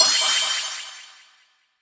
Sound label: Sfx Buff Cast